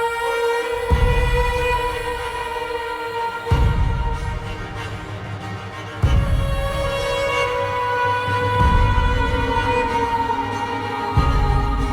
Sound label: Menu Music; Tuvalkane Foundry